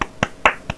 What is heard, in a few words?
clap